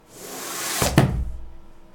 Archiving Folder Down A Wooden Plank

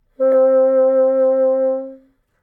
Bassoon C 4 Tenuto Vibrato

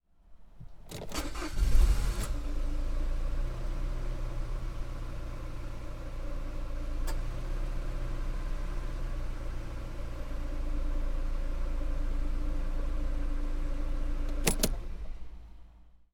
Car engine start stop

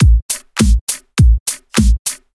Electronic Drums 102 Bpm

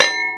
Metal Clang

